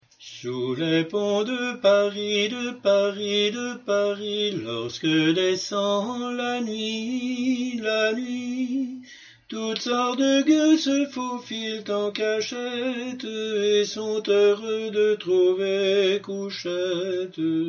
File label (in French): tenor; sous ponts paris